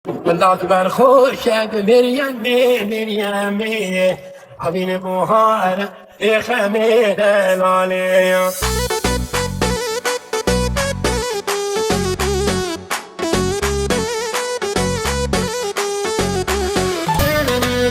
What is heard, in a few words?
Kurdish Remix